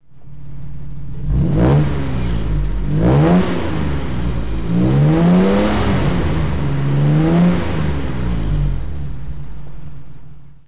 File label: xk150 road